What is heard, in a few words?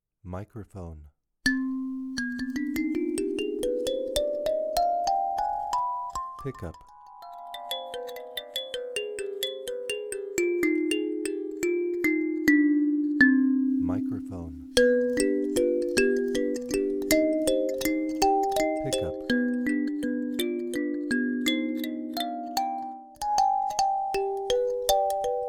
Freygish